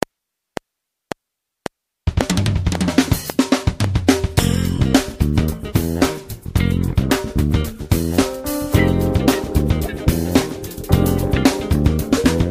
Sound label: Jams Bass